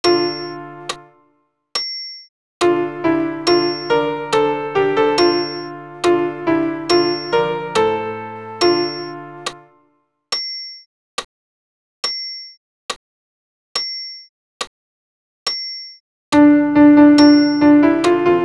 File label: Gloria Amen alt pian acc